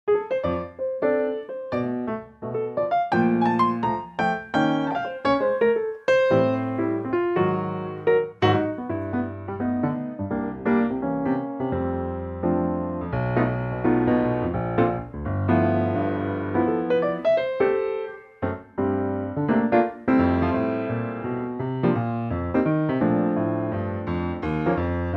piano F; Pianotrak; vocal range - C to D; The classic showtune from South Pacific in a swinging piano only arrangement.

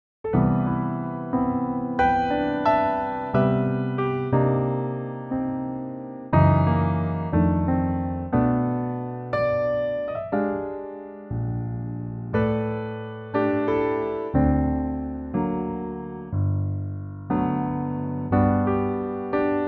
G Piano; key - G - vocal range - (optional D) E to F#

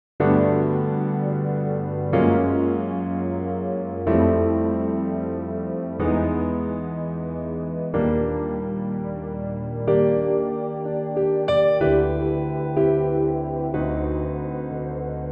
G pad piano

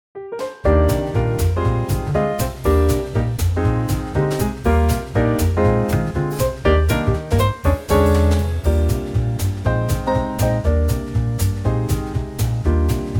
Eb swing; key - Eb - vocal range - C to C; Fantastic swinging Trio arrangement of this wonderful old standard.

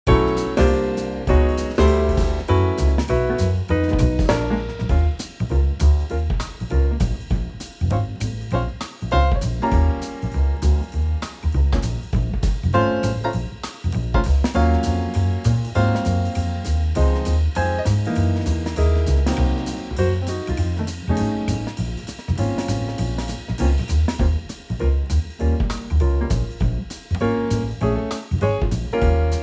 Bb swing; Bb Jazzville; key - Bb - Real Book changes but keeping to Eb7 to Bb- & Bb7 for the A sections

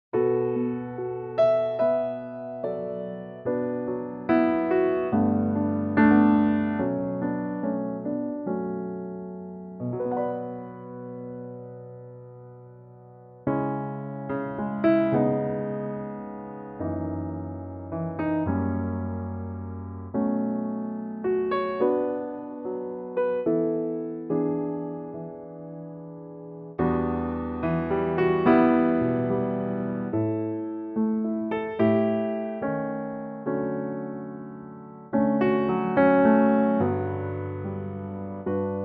D Piano

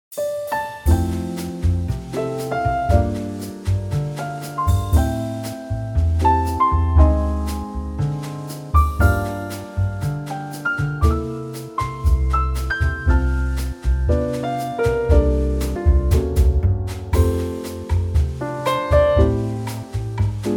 F Bossa; key - F - vocal range - B to A; Very lovely and laidback Bossa Nova arrangement of this popular standard. A great tempo with light piano li

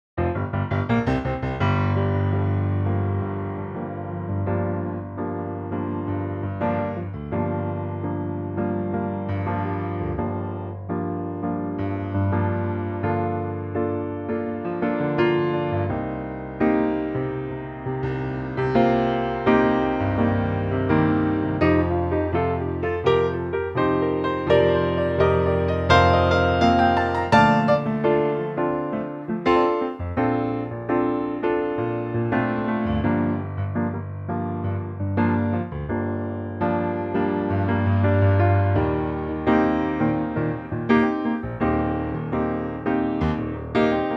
piano trak Gm; range - G to F

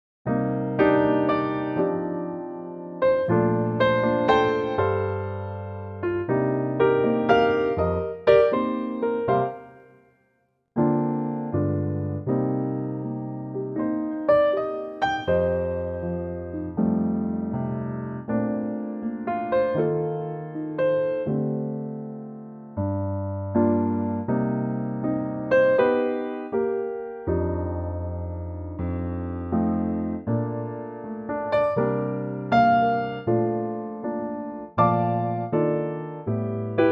Bb piano; Pianotrak; key - Bb; range - F to Bb; Great piano only arrangement of this classic standard, perfect for an intimate vocal

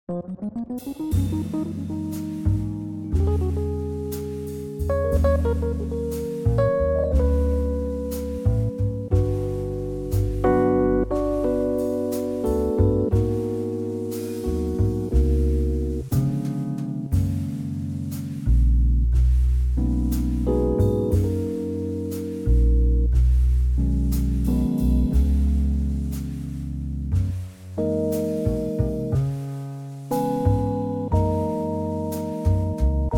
Rhodes Trio Gb

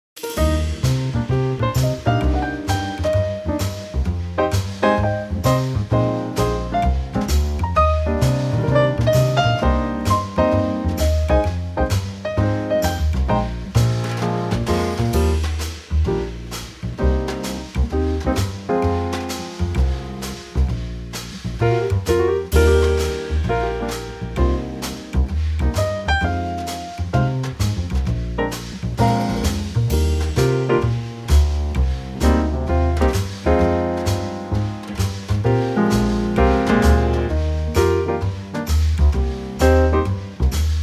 Eb Trio; key - Eb - vocal range - D to Eb; A lightly swinging Trio arrangement

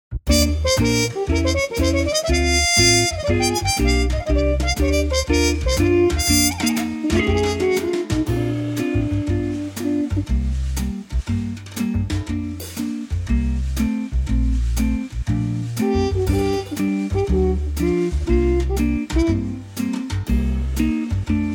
Bb swing; Superb Quartet arrangement of this wonderful standard, in a lightly swinging arrangement. Rhythm guitar

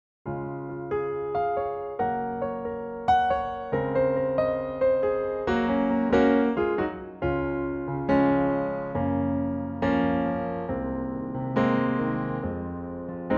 Piano; key - Db - vocal range - Bb to Db (optional E and Eb)

